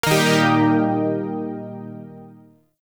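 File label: Shawzin Pentatonic Minor Chord; Zariman Shawzin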